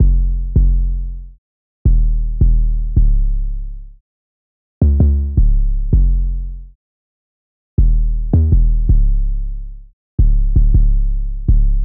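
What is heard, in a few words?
808 F min 162bpm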